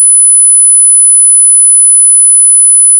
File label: 10kHz SBC; sbc-aptx